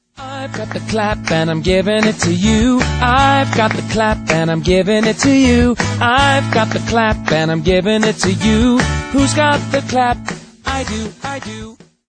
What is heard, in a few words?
the clap